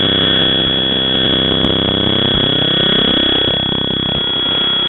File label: static end; Five Nights at Freddy's; FNAF 2